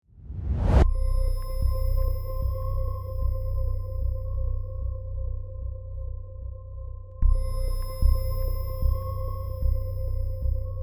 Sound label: No Percussion No Bass; Ninja Tracks